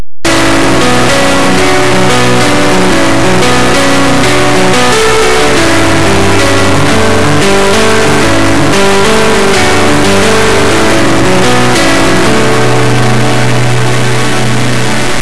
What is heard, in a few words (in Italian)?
musica newage